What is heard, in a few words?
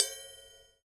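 ride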